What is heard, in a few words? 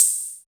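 CR-78 open hi hat; Hi Hats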